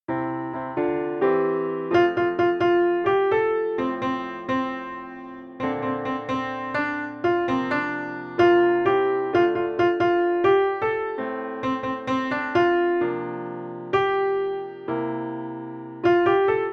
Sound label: Melody; The Little Mermaid - A Musical Adventure; Ariel